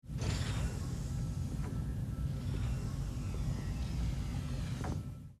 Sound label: ent door